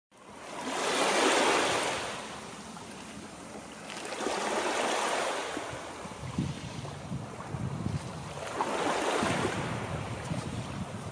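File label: sounds of calm coastal waves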